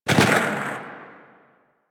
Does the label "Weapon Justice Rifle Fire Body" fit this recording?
Yes